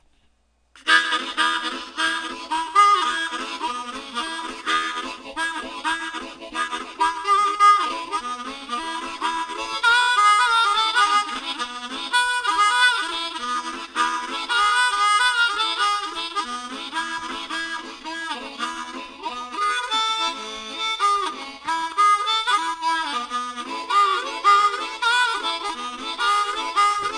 harp jam

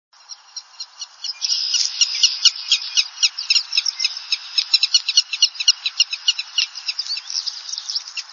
willet alarm call; birdsongs

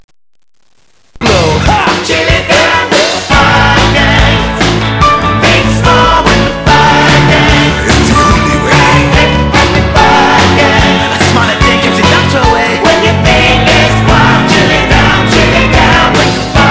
chilly chorus

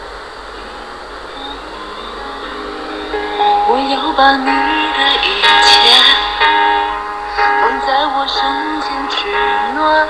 duet

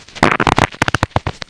fart

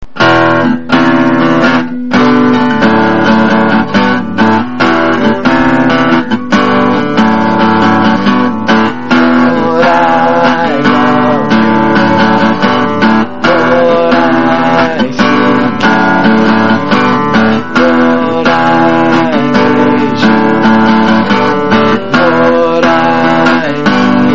Rough Version of My Song With Vocals By Me

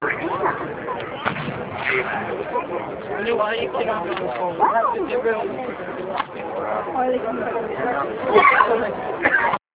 drum hit

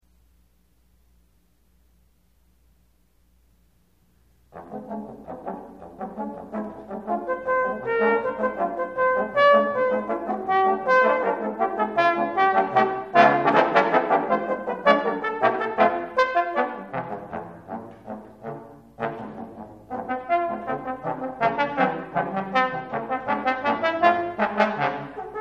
Quartet; Bozza

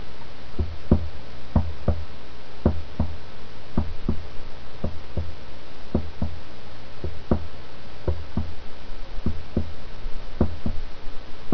telephone

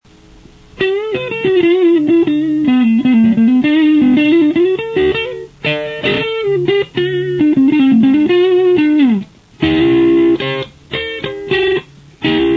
new amp half lo

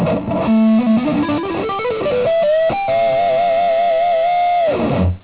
flying beeps

